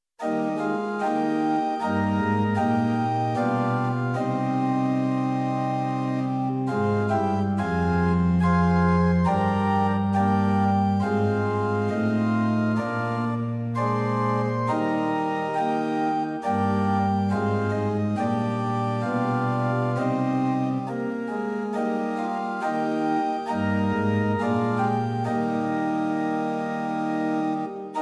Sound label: Pitch 440 Temperament Equall, Prinz 8 4